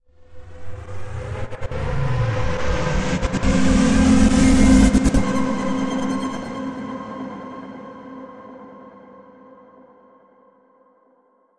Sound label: Riser